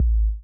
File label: Drum